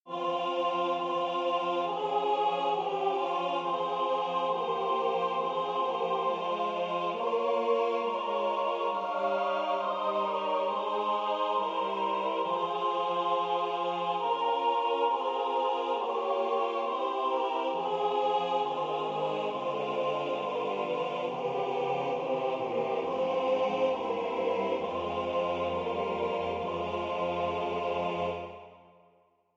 SATB; zeilen zonder wind

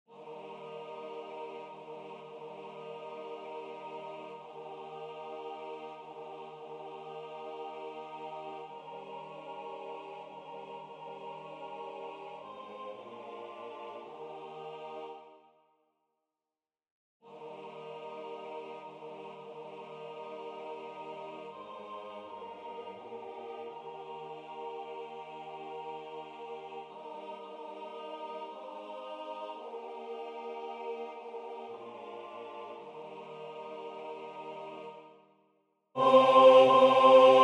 Steal away SATB

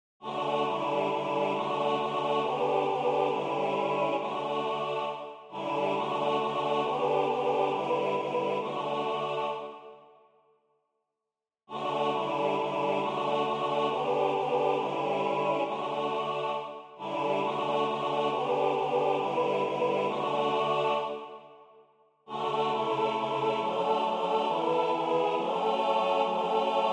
SATB; De Heer is mij tot hulp en sterkte

